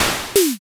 fish jump

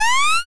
long press

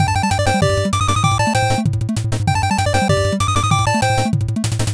bgm

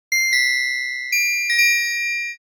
furin

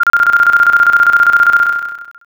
trainbell

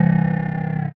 wolf growl

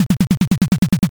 taiko roll

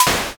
egg crack

